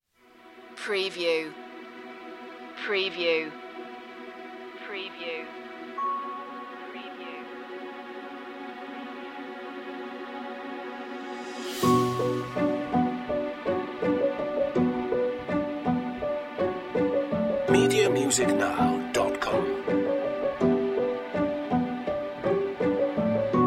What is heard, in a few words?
the verge no percussion